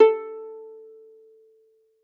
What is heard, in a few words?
Violin pizz ff sul A A4; MIS Pitches - 2014; Strings